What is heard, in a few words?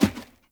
Heavy Grunt Boot Walk Wood, Footsteps; Characters; Enemy; Sinecure